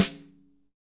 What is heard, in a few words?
snare dry; Real Mega Drums